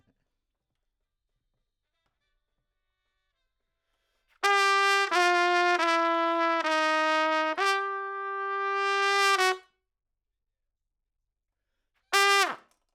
Trump

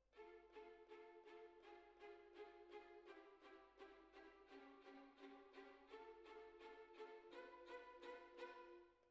Violins, jazz for piano

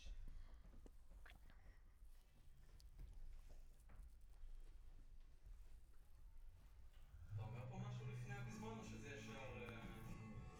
VOC GUIDE

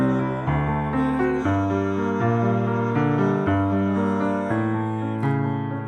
Piano L

